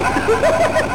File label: Ghost; Skunny